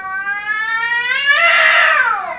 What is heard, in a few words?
ANIML; Zero G; Man Machine